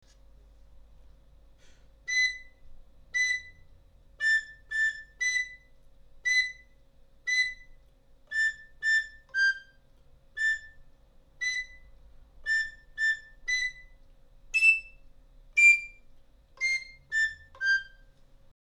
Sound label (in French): sautarello facile